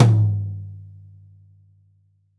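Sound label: Jazz Low Tom, Roland BK-5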